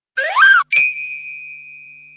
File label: plop